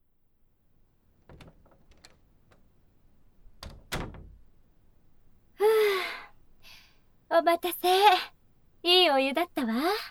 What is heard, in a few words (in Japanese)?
耳かき, ASMR; リアス部長の耳かき！？